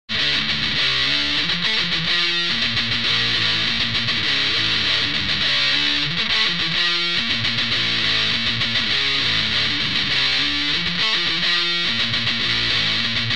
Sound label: Rhytm